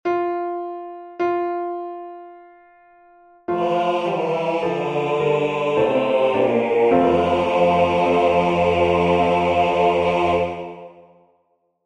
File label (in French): Basses Voix; Final Agur Jaunak